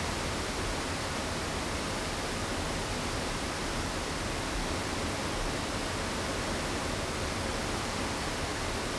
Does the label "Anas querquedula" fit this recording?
No